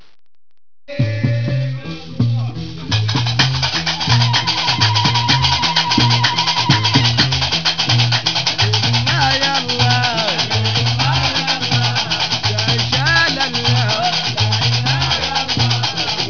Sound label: gnawa